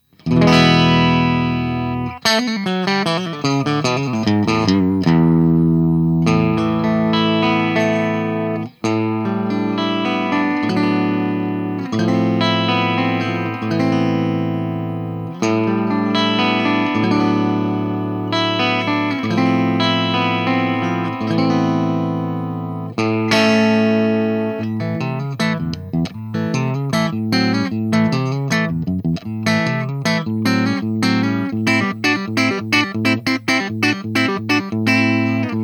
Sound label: ST9 SM9 clean track